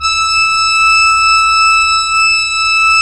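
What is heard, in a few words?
pipe organ; Roland - LA Composer vol. 1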